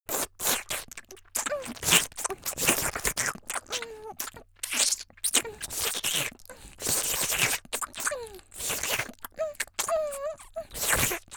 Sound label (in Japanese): 少女 激しい; 元気な少女; 「フェラ」激しい30秒